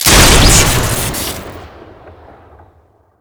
artillery shoot crit, weapons; tf2classic

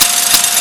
machinegun empty; tf2classic

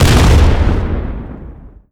airstrike small explosion; tf2classic

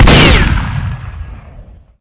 big iron shoot, weapons; tf2classic

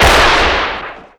revolver engi shoot; tf2classic

